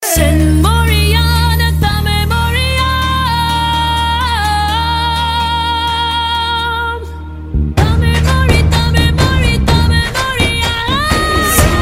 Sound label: tamil ringtone